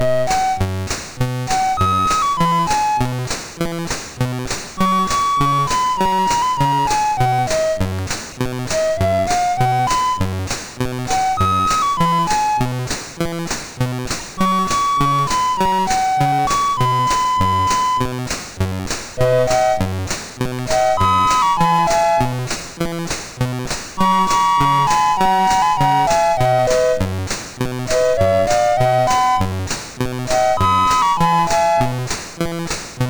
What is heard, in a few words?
Synth Sample, MOS6581R3